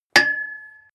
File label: metal impact pole high hard; hits explosionen; metall